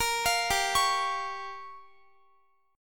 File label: G Minor 7th Flat 5th, Guitar; Gm7b5; arpeggiated; x,x,20,22,19,20